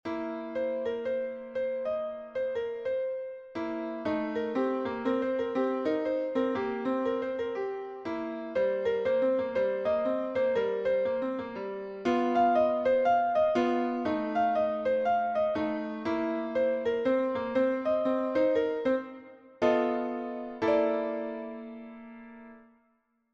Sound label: C Blues Invention; Invention No. 4